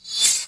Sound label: Window Close